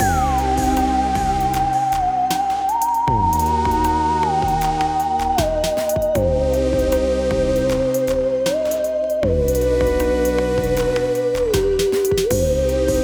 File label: Spooky, Loops